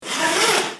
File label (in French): Pistolet à roue